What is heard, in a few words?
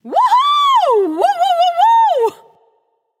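yay, female; smite; voicepack; hera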